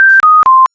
scanner beep minor scale down

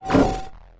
plasma shield

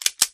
m1911 switch, guns; resurviv

